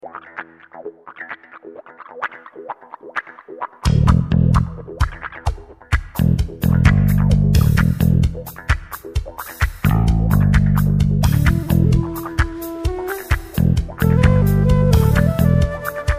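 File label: flute bass electric guitar; musique d'infiltration guitares, basse, flûte, programmation midi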